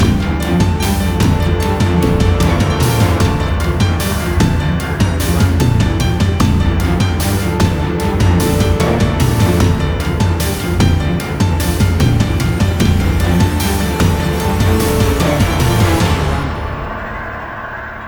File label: Action Trailer Music Cue